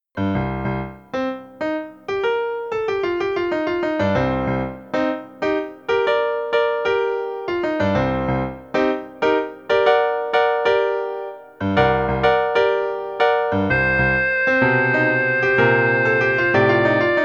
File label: Little Fellow (Synth)